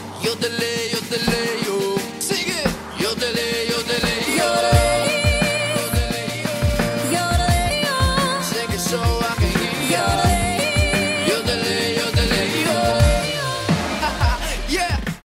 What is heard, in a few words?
yodel